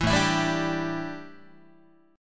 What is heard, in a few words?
A 7th Suspended 4th, A, down, Guitar